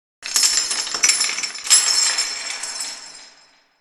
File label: noise boo rattle; Haunter